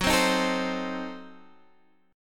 F sharp Diminished 7th F sharp Guitar Half Step Down